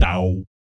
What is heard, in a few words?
BASS FX, Vocal Planet, Jazz FX; Spectrasonics